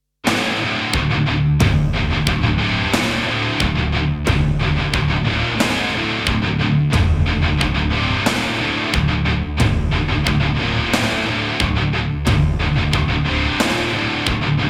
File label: Instrumental